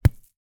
sport petank boules metal ball land in sand

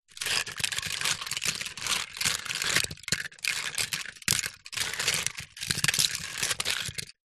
toy cars metal many carpet movement together

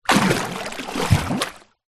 sport swimmer movement in water

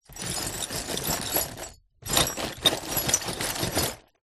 broken glass pieces in cardboard box movements; zapsplat

